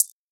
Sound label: hat; Anthemic